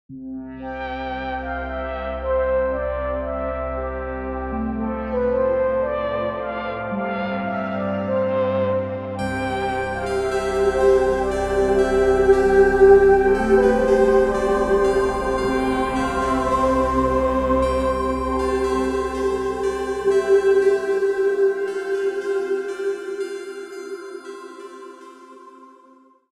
all sounds from polychr

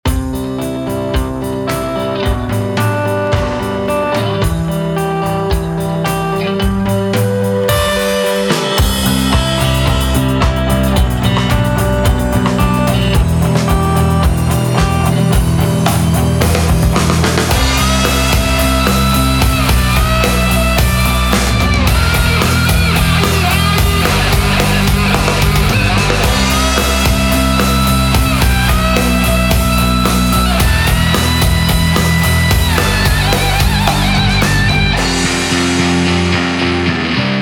drummer heavy kit

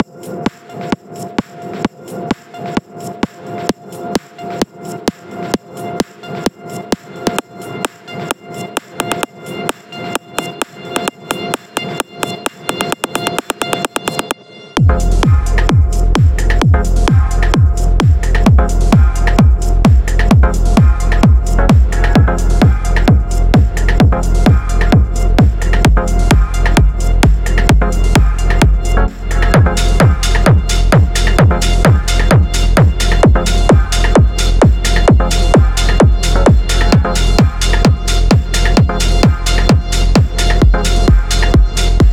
pounding techno kicks

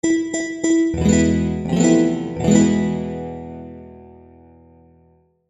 guitar; nuspin

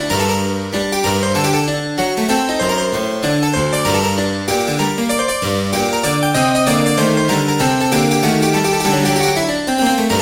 coupled harpsichord

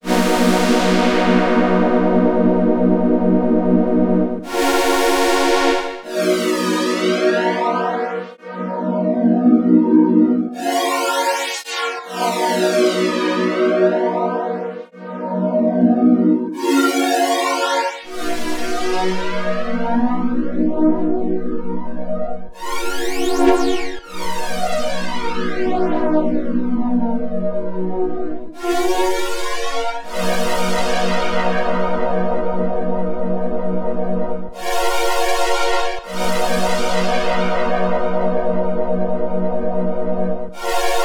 dipole on synth strings

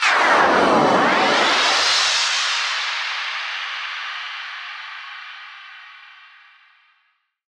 2 bars, owl

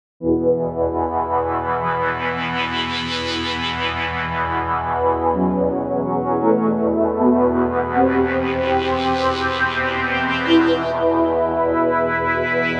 rythmic

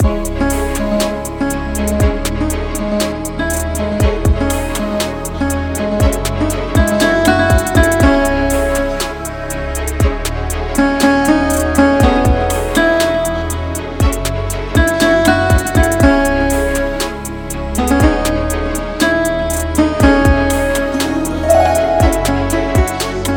sarod

